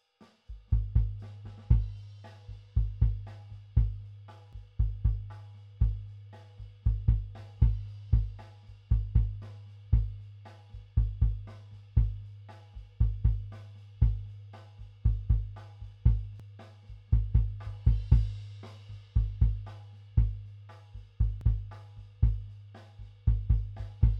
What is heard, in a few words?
Kick